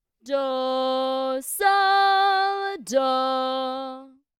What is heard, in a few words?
t d t with d above t